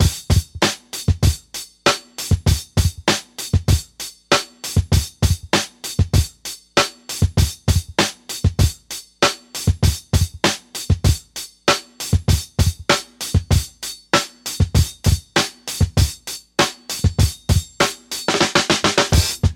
98 bpm old school funk drum loop sample f key, Free breakbeat - kick tuned to the F